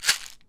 Vitamin D - Shake, pill bottles

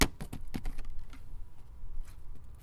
Plastic Thump; BB Retail Therapy Sample Pack

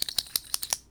Cable Coiler; bb - Smol Mechanisms

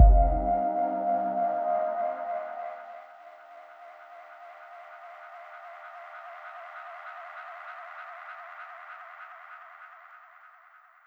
Glittering Fadeout; Pads; BB 2HTC Samples Vol 4

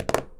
Big Cube - Drop, Rubik's Cube

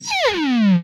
SciFi Gun; retro arcade; Guns

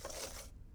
Dry Cut, paper cutter; Micro Pack

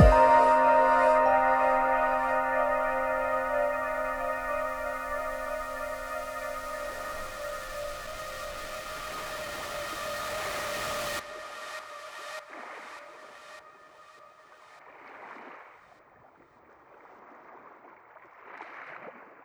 Tension Pad; BB 2HTC Samples Vol 4; Pads

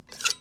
Small Knife - Scrape, kitchen knives; Micro Pack

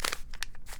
Big Cube Twist, Rubik's Cube

